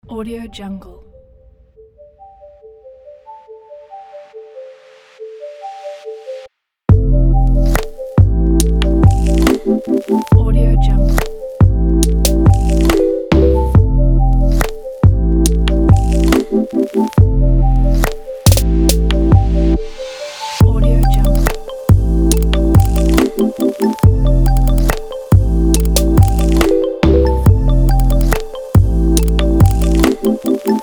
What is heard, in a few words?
abstract organic